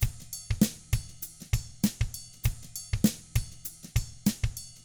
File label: FUNKY Drums; ILIO; Double Platinum Drums 1; 99 FUNKY 2